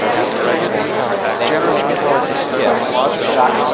babble; IBM; wsj0

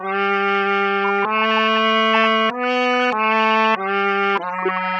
separated trumpet

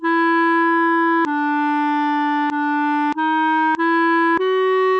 original clarinet

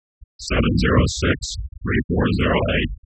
Noise factory; IBM

